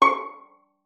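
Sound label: violin pizzicato C#5; Xsample VOL-5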